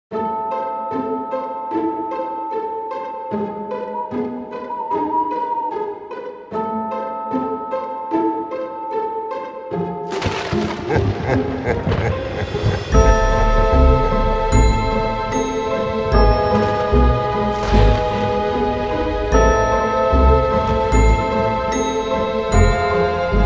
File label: Halloween Ghostly